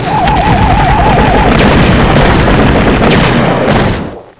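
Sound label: sword fight; USA2010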